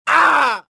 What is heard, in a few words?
choke; Star Wars Jedi Knight II Jedi Outcast; XBOX; bespincop